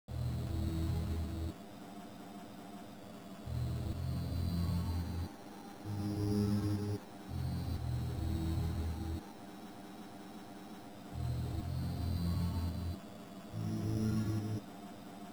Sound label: Atmosphere; Valhalla Before the War; amiga; Level 1